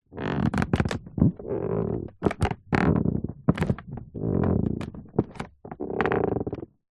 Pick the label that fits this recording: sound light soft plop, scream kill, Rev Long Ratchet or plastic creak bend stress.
plastic creak bend stress